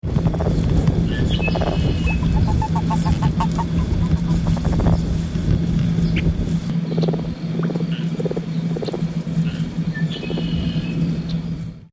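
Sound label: rdraytonii release calls, frogs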